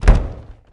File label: Boxing Ring Bodyfall